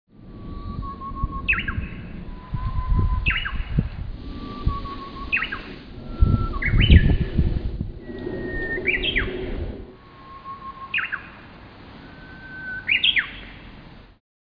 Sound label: nightingale